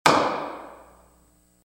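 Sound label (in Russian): сухой удар; Звук молотка судьи в зале суда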